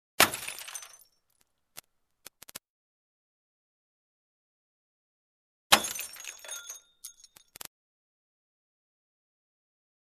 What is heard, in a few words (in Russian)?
avariya nebolshoj udar steklo metall